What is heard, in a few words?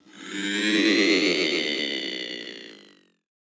Movement; 99Sounds; InterSpace